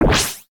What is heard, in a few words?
open; shweets sim; braixen